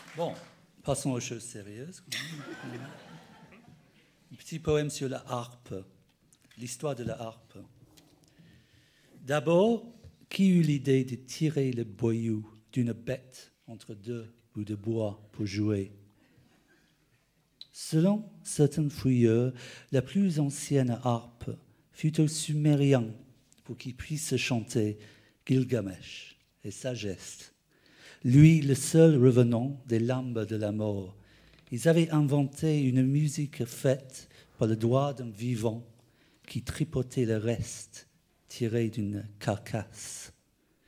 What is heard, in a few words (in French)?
La Harpe; Philharmonie Oulipienne